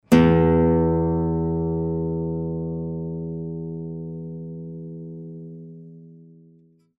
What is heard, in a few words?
String (Mi E), guitar